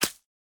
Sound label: hit; dapopinata